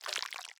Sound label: slide, block, honey block; Minecraft